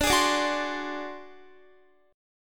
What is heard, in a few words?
D 7th Flat 5th D down Guitar; D7b5; strummed